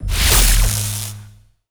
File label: Electric